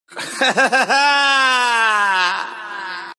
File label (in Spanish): Risa